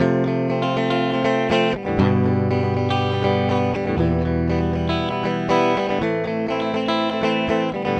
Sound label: line arpe